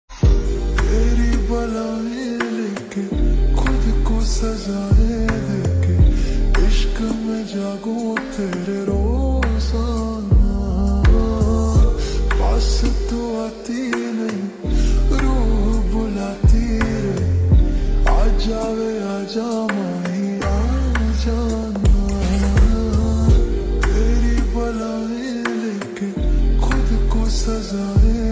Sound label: Ringtone Slowed Reverb